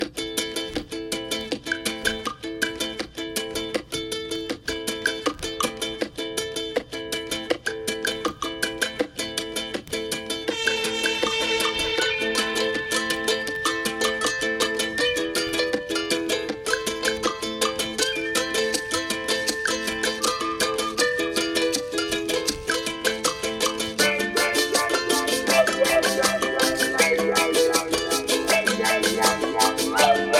interlude cumbia; salsa

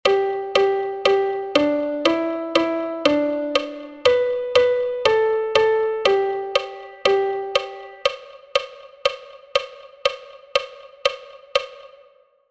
old macdonald qua sound

